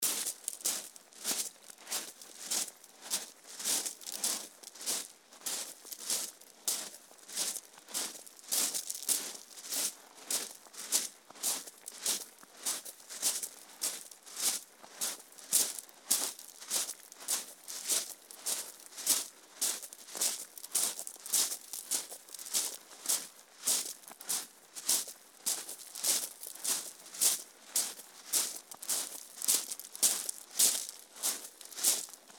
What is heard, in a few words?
Waking on pebbles along Rainbow Cove; Isle Royale; moose of a morning